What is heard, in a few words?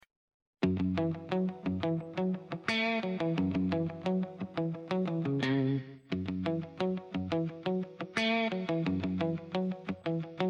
Instrumental; Caterpillar